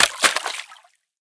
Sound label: riffle drop puddle; STALKER; IWP